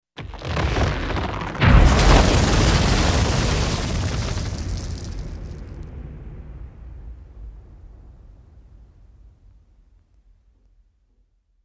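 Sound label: Explosive Earth Rock, earthquakes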